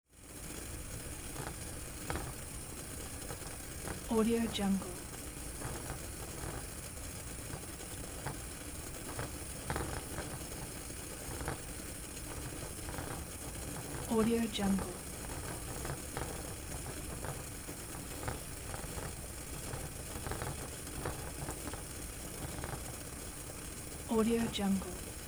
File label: metal pot lid boiling rattle